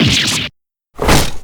damage; hugh

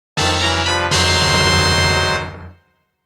item get; loz

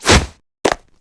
badge pull short

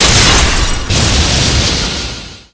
cross swords

